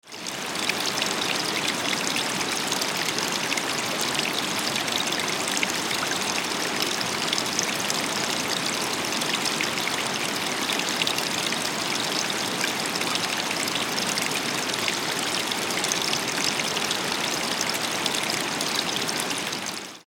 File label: Rapids